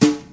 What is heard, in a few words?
raw acoustic snare sound D key